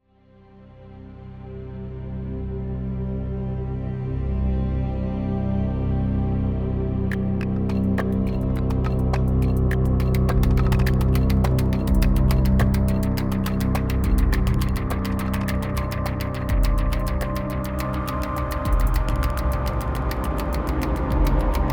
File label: Rhythmic, Vibey